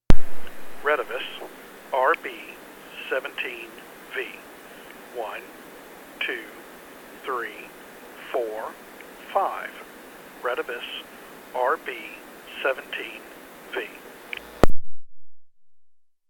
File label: retevis rb17v